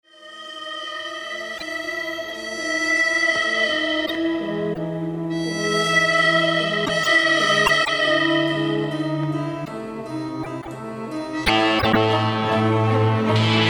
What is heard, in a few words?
western duel